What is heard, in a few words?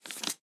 wood tile drop